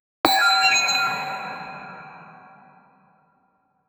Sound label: item drop clear clink